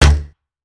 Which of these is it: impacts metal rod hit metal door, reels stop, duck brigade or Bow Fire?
Bow Fire